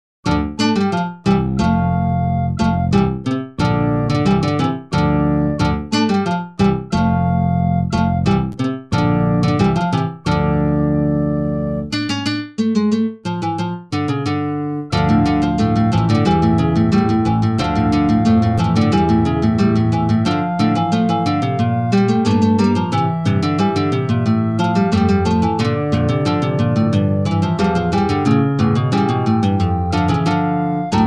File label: Overworld Acoustic; Actraiser